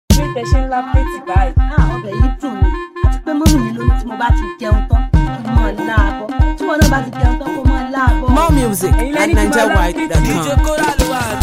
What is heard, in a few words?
Beat